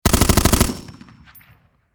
Warfare Machine Gun Springfield M 14 Long Burst Close Perspective; SFX Bible 6